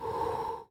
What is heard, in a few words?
throw; basic games; mcpgames; cooties